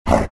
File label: grunt, greevil; courier